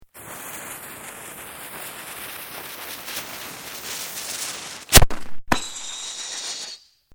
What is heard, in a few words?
Fireworks Launcher Single, Domestic Fireworks